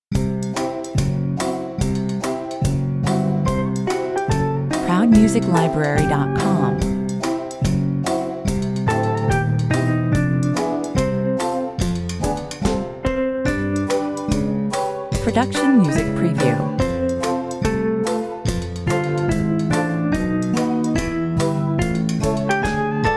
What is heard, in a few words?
toy parade 144 bpm